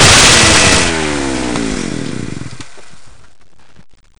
saw kill; slender; cof

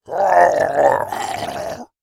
die; slender; zombiebm

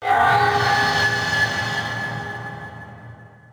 chase; slender; villar

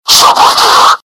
alert; slender; gunner

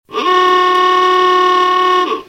awooga

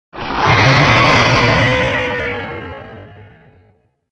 die; slender; hellknight

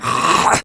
attack; slender; zombiebm

